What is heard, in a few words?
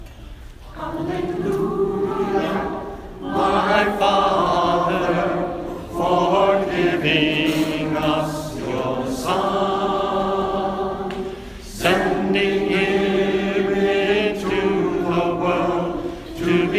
Hallelujah My Father, organ